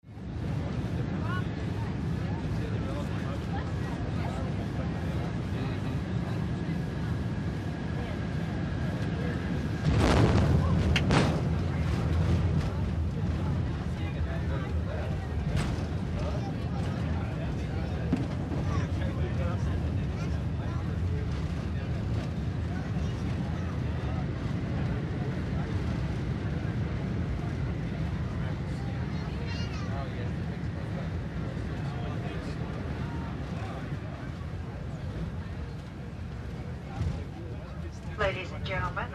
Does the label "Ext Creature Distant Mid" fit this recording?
No